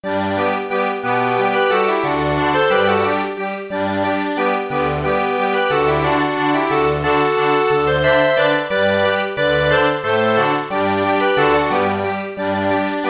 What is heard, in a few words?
Tauberer, accordion